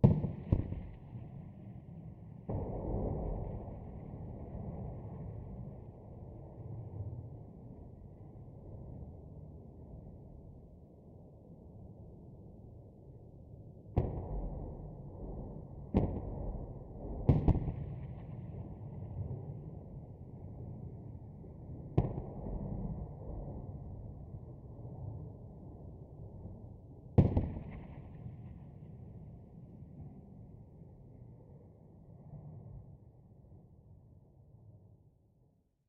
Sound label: ambient shooting; STALKER